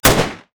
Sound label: g37 fire; STALKER